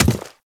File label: Step Default; Warframe; Ballas Chimera